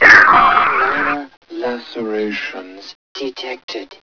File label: zombie pain; sven; zombie hev